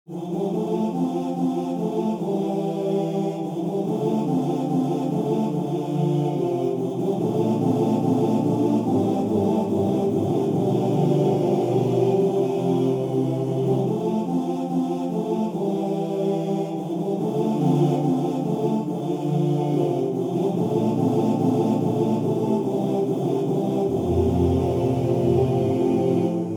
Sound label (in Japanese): yasashii uta dansei midi